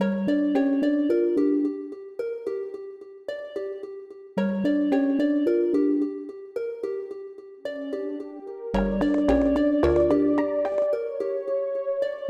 Main Music; Plants vs. Zombies

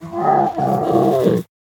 whimper

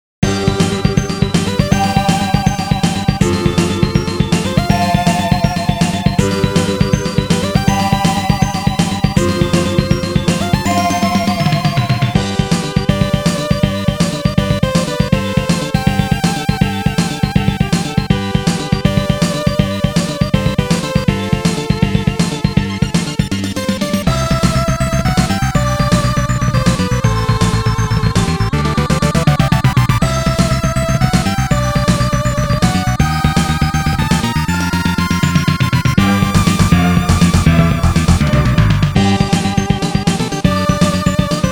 Stage 1 BGM; Sand Storm

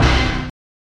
Intro Jingle; brainies; snes

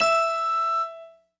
Tone; lego my style preschool

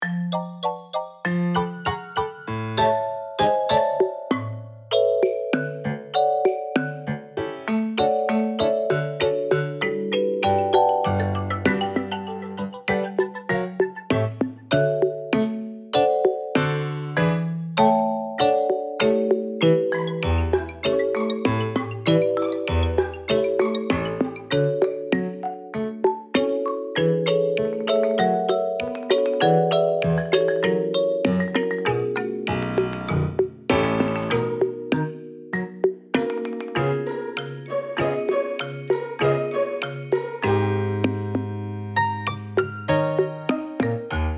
BGM FAIRY

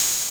Dance drum Kit Open Hi Hat; puchicon; dsi ds

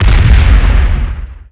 Rocket Explode; Nicktoons Nitro; arcade

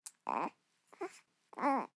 baby three weeks old babble

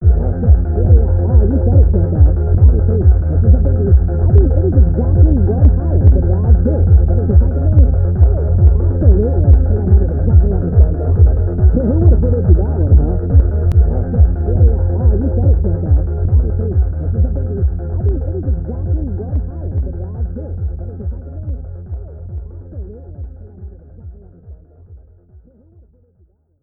Distant Troll Radio; Skylanders; Imaginators

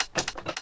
Lock

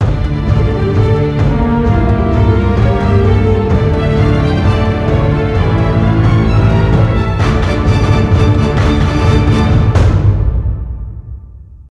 Boss Stage Clear; Gauntlet Seven Sorrows

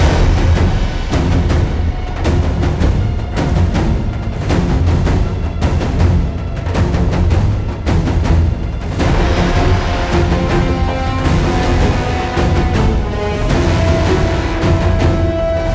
Combat High Threat Perc Short Loop

